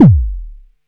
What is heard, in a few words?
Axxe Kick, ARP Axxe Drum Machines